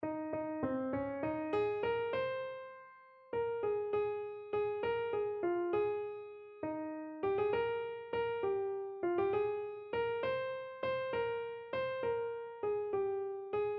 in the garden, keyboard

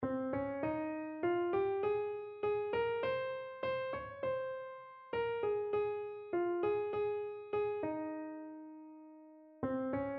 since jesus came, keyboard

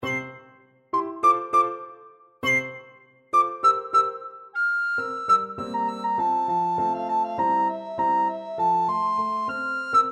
pizzicato polka, recorder

